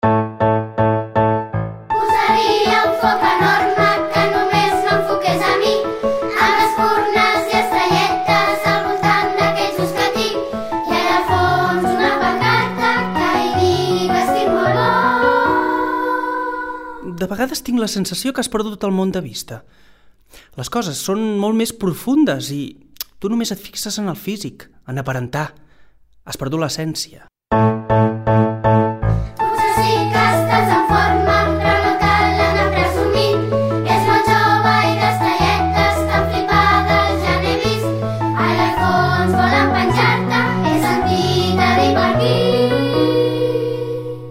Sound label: Duet